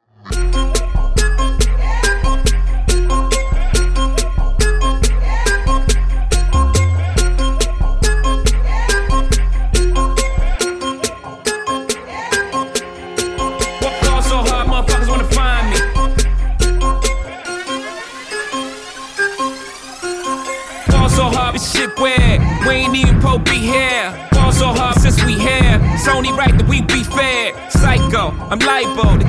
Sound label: marimba remix